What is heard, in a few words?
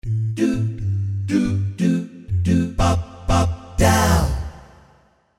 VP550 Jazz Scat